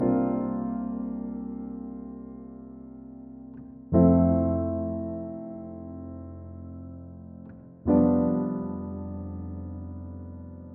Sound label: 122 BPM Piano Chord Progressions Cinematic Drama Cmaj Amin Tonic; Tonic F; MMB